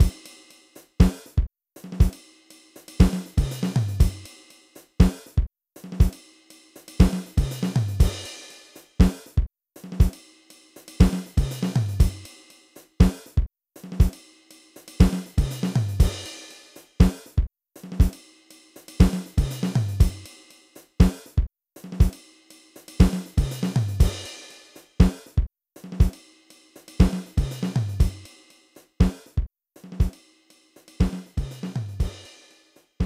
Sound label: Boom Box; Independent